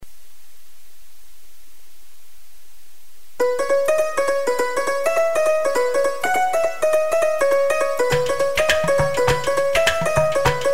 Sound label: Instrumental